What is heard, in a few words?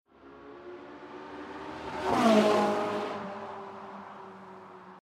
458 Italia High Speed Drive